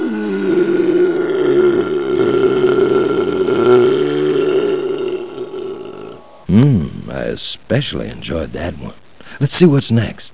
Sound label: highclass burp